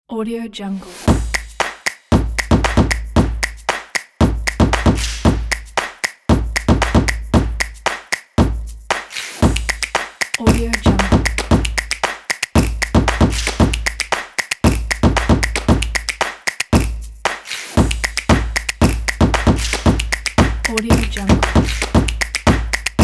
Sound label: the snaps intro